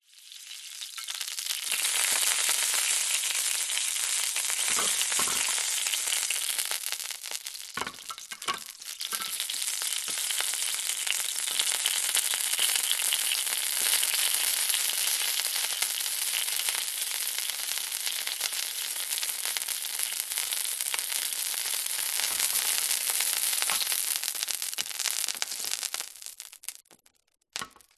food cooking oil mixed with water hot pan sizzle pop; zapsplat